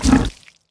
Barf; smite; god bakasura

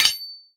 minion battle foley metal hit; smite; npc; roman archer

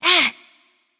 nv ouch; charybdis

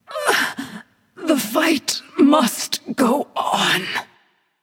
death; smite; voicepack; hel

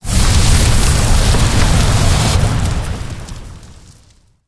fire; smite; heimdallr

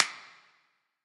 clap; smite; pele

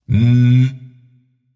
tongue; smite; arthur

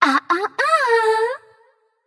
disagree light; smite; voicepack; hel

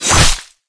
alien lobby landing; smite; serqet

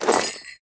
armor heavy; smite; chiron

